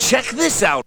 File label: VOX GENERAL; Megabass Remix! 2